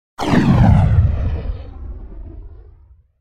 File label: Weapon Sci-Fi Firing; Hollywood Edge; sound designer's toolkit v2